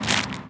Flamin Hot Cheetos Bag, percussion; HOUSTON; Moody Park

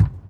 Ticket Counter Perc; Houston; Moody Park

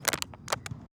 Water Bottle Crumple, percussion; HOUSTON; Fifth Ward

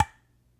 Plastic Bottle Snare, percussion; Lindale; HOUSTON

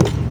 Bleachers Perc, percussion; HOUSTON; Moody Park

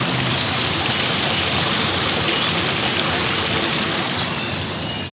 Water Feature; Downtown Houston; Preston and Main

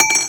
Moody Park Chimes Perc; Houston

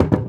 Transit Center Bucket Perc; Houston; Northline

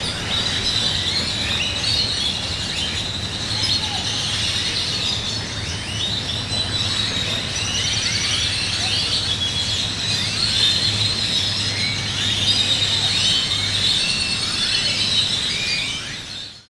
Downtown Houston Grackles

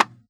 Monster Can, percussion; Houston; Moody Park

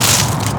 Tree Branch Perc, percussion; Lindale; HOUSTON

